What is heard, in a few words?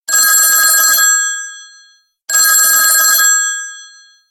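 Old British Telephone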